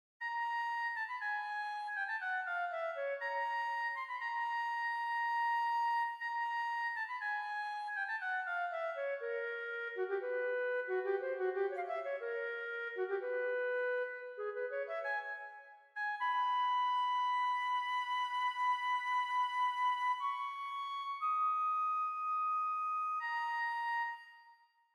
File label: raw midi